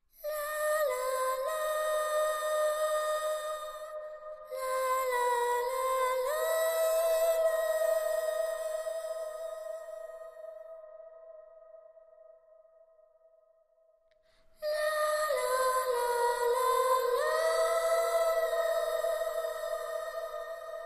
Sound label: Vocal Sting